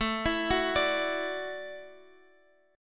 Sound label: A 6th Suspended 4th, guitar